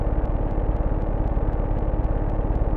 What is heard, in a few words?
Hover Car; Cars; Megacity